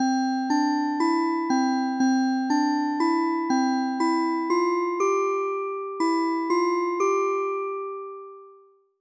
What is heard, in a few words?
harmonize